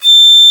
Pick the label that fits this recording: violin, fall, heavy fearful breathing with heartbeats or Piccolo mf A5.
Piccolo mf A5